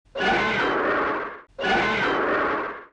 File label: Roars; Bombaron